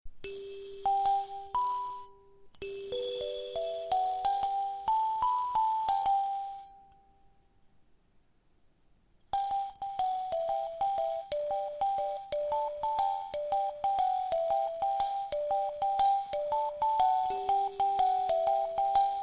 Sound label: High G Buzz, kalimba